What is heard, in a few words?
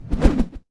axe pass by; Mount&Blade Warband